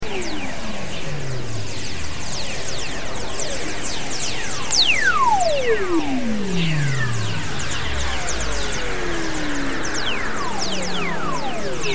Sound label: whistlers; plasma wave; rbsp